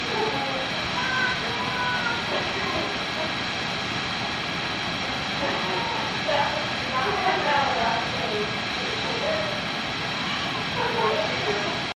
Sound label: mama baby cry